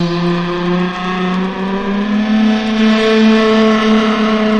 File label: motor air raid; Sound FX Real Life